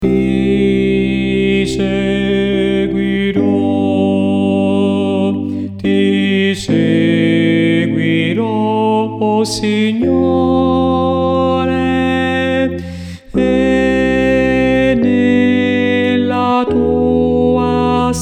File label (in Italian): tenori